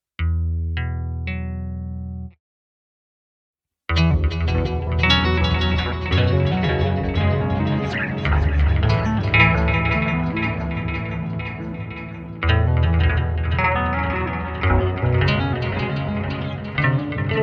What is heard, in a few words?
Bass Sequence